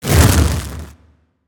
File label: Fire bolt; gamerip; shelter 69